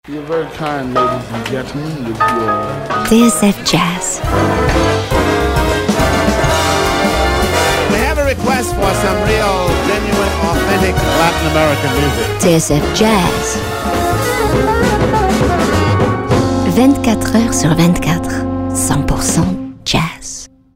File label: jingle jazz